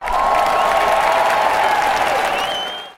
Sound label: congrats